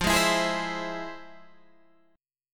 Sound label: F Minor Major 7th, F, Guitar, Open G